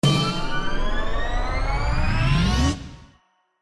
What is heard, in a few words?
ANTICIPATION SCATTER; slots; Zodiac Millionaire